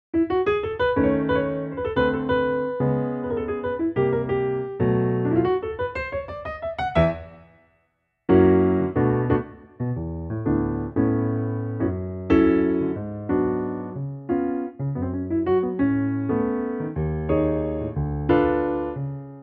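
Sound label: A piano track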